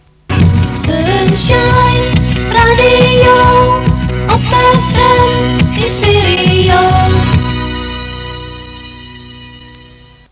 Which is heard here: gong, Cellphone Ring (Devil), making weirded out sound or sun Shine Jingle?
sun Shine Jingle